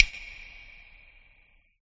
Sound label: click reverb